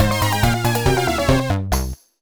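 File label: Jingle Fail; minna no oekakiya san 2009 nds gamerip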